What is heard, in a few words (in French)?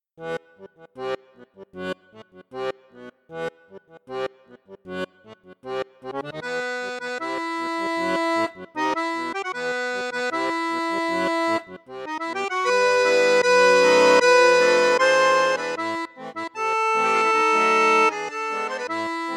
registre basson flute juste, fratelli crosio super pro accordeon